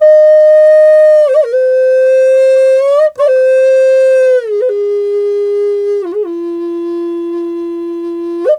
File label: F wild flute